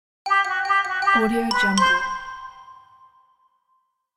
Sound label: cartoon